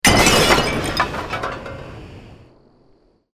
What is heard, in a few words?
explosion